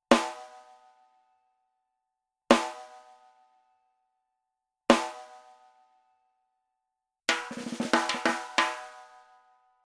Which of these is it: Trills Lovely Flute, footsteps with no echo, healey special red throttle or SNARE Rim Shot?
SNARE Rim Shot